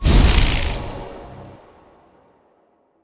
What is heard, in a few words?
Lightning Spell Cast; AudioWizards